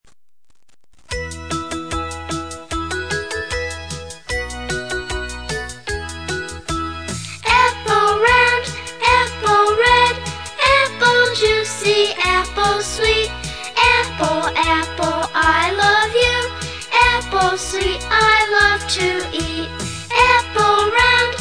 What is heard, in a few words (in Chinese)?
apple song 英语儿歌; 英文儿歌